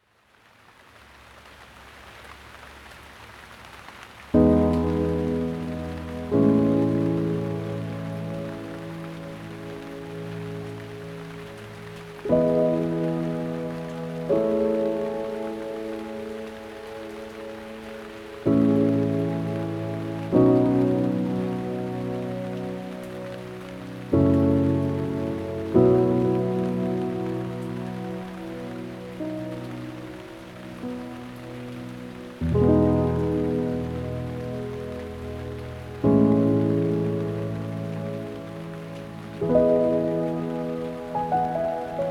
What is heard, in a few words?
with rain, piano